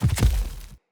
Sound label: Corrupted Battle Dog Land Step Heavy, Footsteps; Animals; Foley